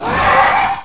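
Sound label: SCORPION CRY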